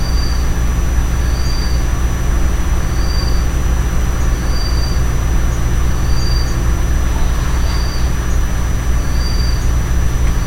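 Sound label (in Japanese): akisame, autumn rain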